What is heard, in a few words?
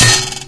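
crossbow hit, weapons; video game; source engine; hl1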